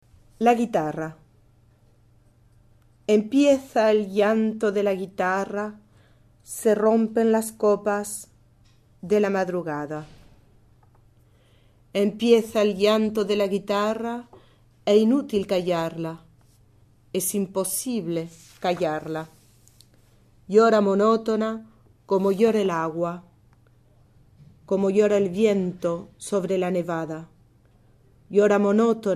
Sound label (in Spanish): La Guitarra